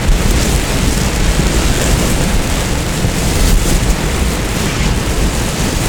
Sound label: Avalanche Rumble Loop; Destruction